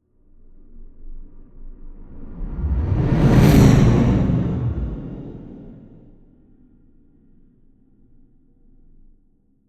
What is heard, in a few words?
whoosh race